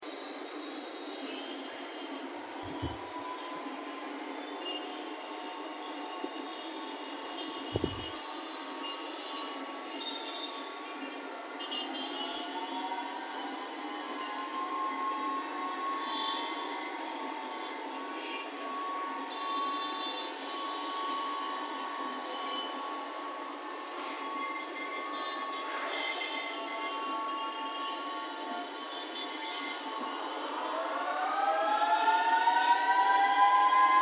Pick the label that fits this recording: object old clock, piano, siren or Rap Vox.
siren